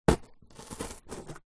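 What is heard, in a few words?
foley cardboard box knife stab into twist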